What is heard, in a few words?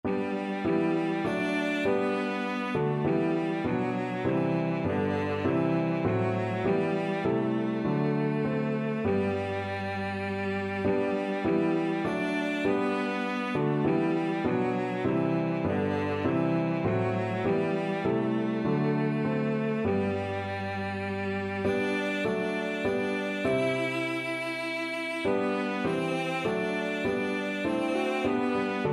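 violoncello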